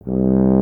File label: BRS TUBA, Solo Instruments